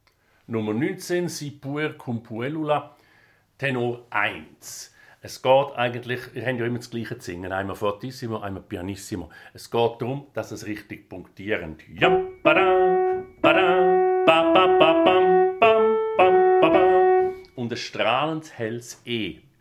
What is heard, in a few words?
Tenor